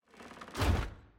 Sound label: Office Door Close